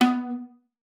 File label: B3, VIOLA PIZZ; Xsample VOL-5